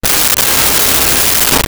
FUNNY; CLOCKS,PRICERAISE,MUSIC SOUNDS; Rosegarden

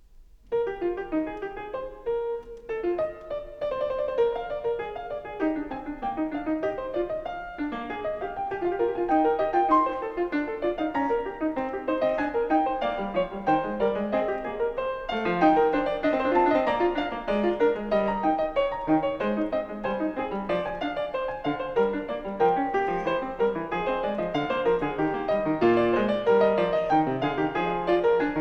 Fugue No. 7 in E-flat major; BWV852; Glenn Gould; Bach; The Well-Tempered Clavier; WTC Vol I

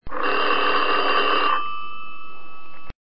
Classic, ringtones; Google; Android-htc

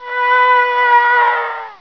HORROR, Menigtes

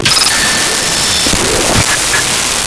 starter; Sound Effects Library (28Cds)

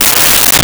Raspberry, Comedy Vocals; Vintage Cartoon; SONY PICTURES SOUND EFFECTS SERIES

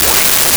signal; Sound Effects Library (28Cds)

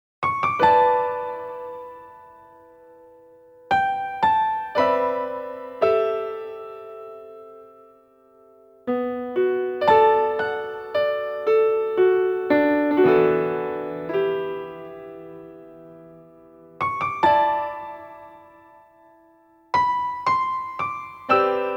Piano Ver.; Kanon

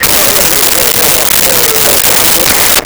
Police Radio Chatter; Communications

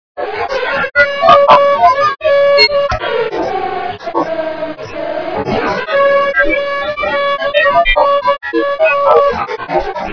air, Alarm en Sirenes

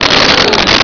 sfx pod ani shift

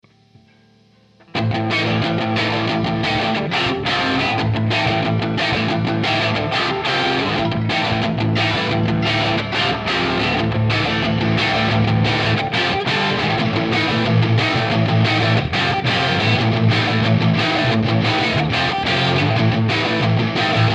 guitar only

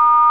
TONE STAR; Zoemtonen hisses pingen woesjes tikjes

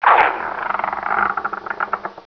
MAGIC; Zoemtonen hisses pingen woesjes tikjes

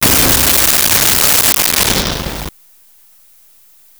Wrong answer

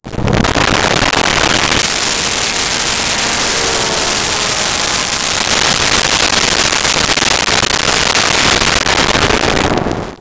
18 Wheeler Horn By 45MPH; Vehicles; Cars & Trucks; SONY PICTURES SOUND EFFECTS SERIES

